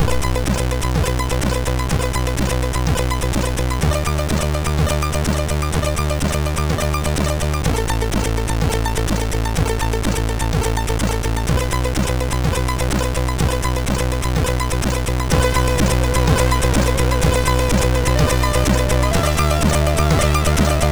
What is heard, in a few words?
tune, sid MOS6581R2; Digital Zakx